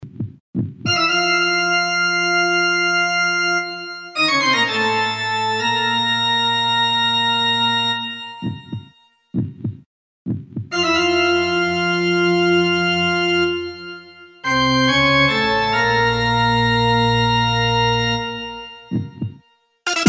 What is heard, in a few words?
toccata